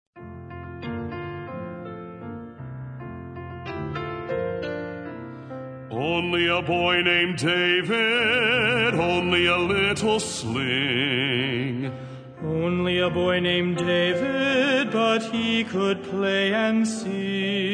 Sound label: Men's Quartet